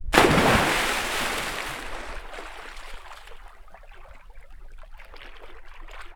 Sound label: Water; TOOLKIT vol.2